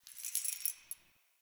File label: Keys Jingle